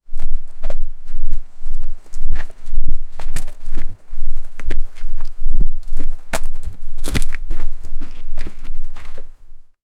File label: cat paws on dusty planks; transport